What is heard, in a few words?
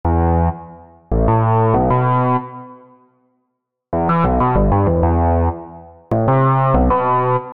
Bass; PatchFactory